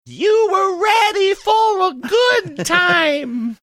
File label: singing funny